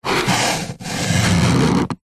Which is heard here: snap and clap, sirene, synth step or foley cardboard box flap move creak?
foley cardboard box flap move creak